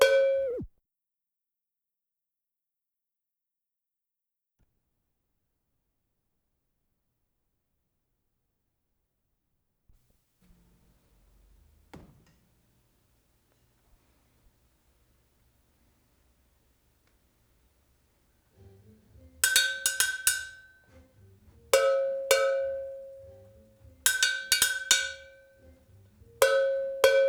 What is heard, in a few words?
cowbell